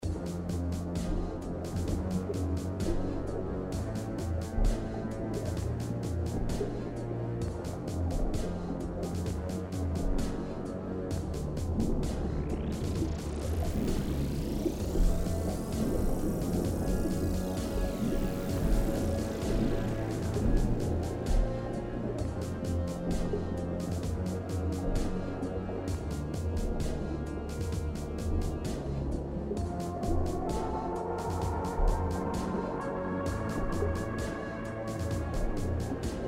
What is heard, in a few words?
Ambient; Bionicle